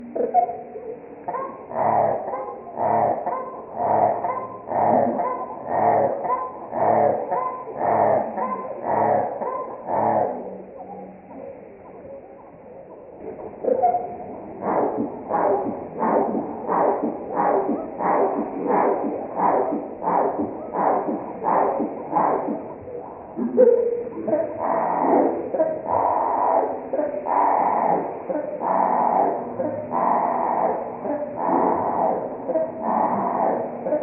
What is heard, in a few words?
Nebraska Western male at Pony Lake 4 songs 1 slowed 8x, Marsh Wrens; Donald Kroodsma